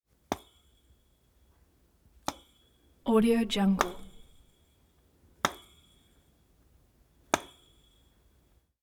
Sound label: light bulb tap head dings tiny metal pokes; prosoundfx